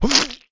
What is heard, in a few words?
piglette damage; Angry Birds Space